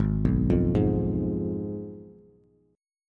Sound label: Ab Minor 6th, Ab, Bass; Abm6 arpeggiated; JGuitar